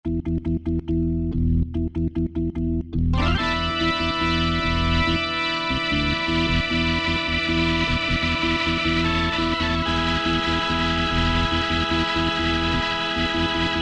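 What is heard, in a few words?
Organ, Yamaha TG500; Preset Performance; OR Gimme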